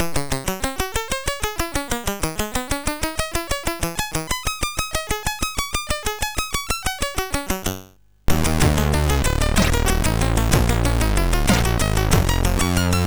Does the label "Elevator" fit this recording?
No